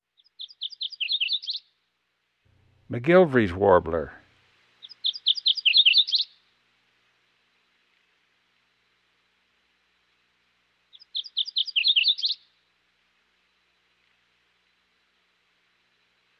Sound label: MacGillivary's Warbler; Western North America; Dan Gibson; Wildlife Identification By Sound